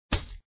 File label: Blunt Hit